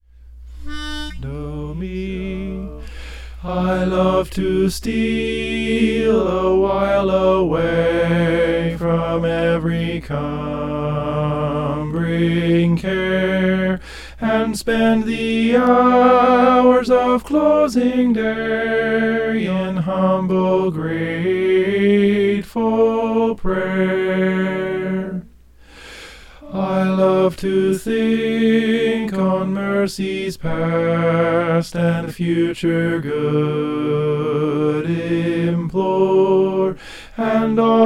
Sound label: soprano; i love to steal